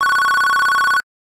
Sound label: Electronic sound, ringtones; Fujitsu F-42A